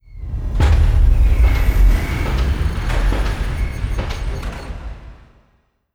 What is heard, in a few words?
dyson sphere build upgrade; Stellaris; utopia